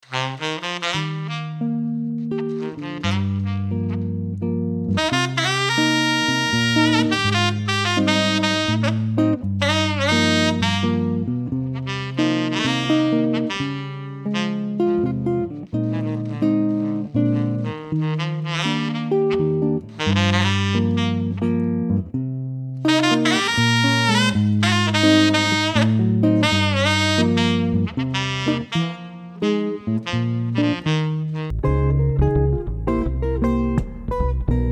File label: saxo solo medley